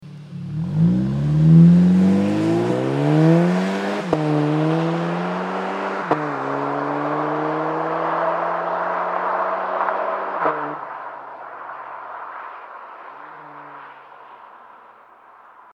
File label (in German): Losfahren, Catback-System Golf VII GTI; OPF; vor facelift; Abgasanlage